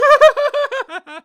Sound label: Laugh